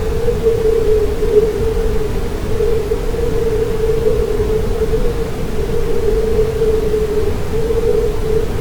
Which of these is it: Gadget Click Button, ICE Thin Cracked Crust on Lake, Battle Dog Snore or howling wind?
howling wind